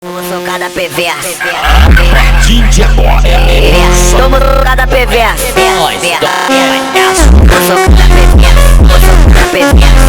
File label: brazilian phonk